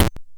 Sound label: Bent Kick; glitch; Bent Hits